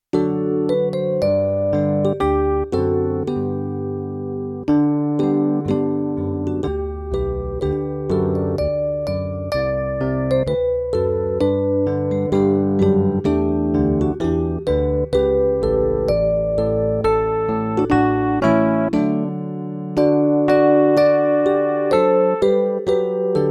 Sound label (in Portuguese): playback hino